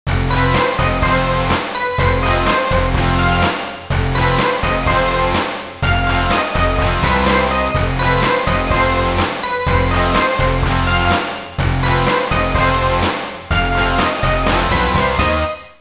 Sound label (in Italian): suonerie musica rock